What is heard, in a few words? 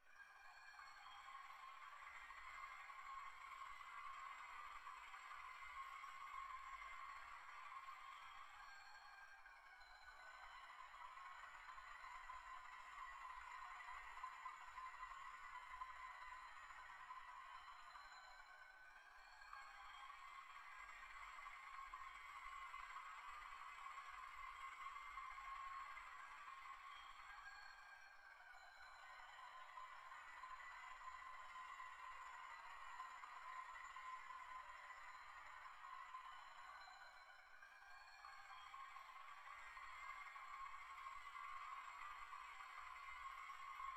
hum